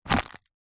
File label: plastic disposable cup squash crush; household; zapsplat